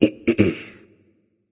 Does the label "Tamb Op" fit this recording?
No